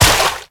Step Water; Warframe; Zealoid Prelate

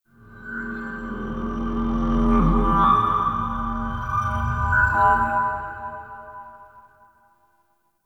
Tech Texture Large, Ambience; Warframe; Cephalon Weave

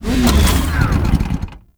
Motorcycle, Dismount Moving; Warframe; Vehicles; Motorcycle Ninety Nine

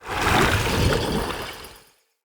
Dendrit Staff Caster Proj Whizz By; Weapons; Staves

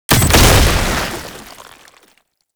Lancer Death Explosion; Warframe; Lasrian Soldiers

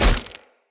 Sphere Polearm Medium Impact Flesh; Weapon Impacts; Player; Combat

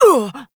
androgynous exertion; Warframe; The New War

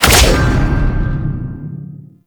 Dash Hit; Monolyst; Warframe; Uriel

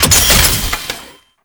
Gunbrella Reload; Weapons; Warframe; Tenno; Koumei

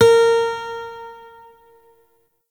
Shawzin Oct Two A Sharp; Warframe; Lotus Shawzin

